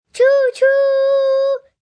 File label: Train Choochoo, Imitation, 1 child trying to imitate a train; Exclamation Sound Effects